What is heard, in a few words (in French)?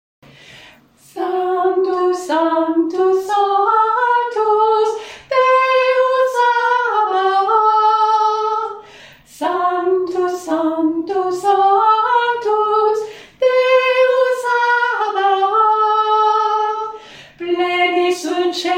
sanctus messe; st boniface